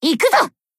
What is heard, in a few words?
jump; Iori